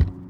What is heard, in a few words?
Ticket Counter Perc